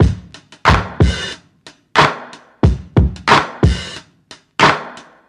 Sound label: claps, Drum loops Funky